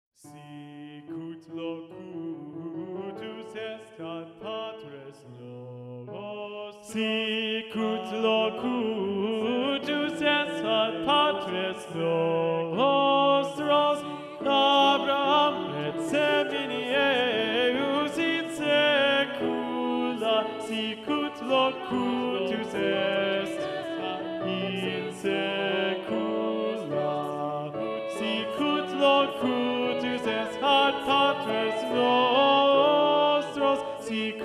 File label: Sicut locutus est SATB - Tenor Predominant